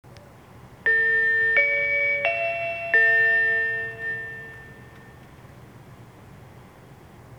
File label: chime; jr-west